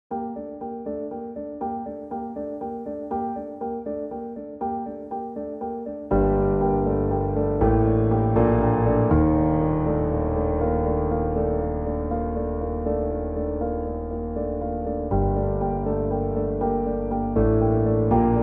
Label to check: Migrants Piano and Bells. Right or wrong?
Right